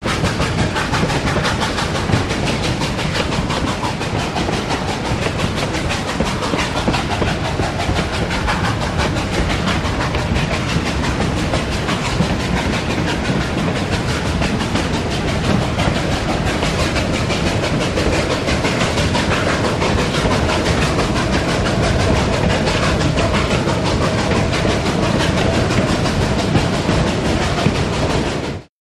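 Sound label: Steam Train Steady Engine, steam train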